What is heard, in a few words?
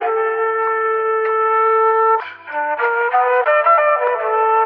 flute, Loops Phonky